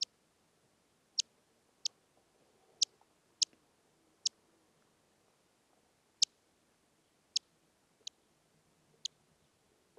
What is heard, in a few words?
hoary bat